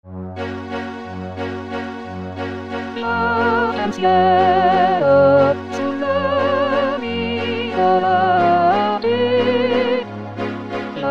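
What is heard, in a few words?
Soprano 1; Verdi; Hebrew slaves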